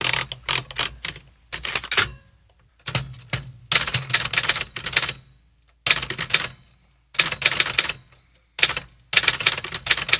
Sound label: Typewriter Manual; typewriters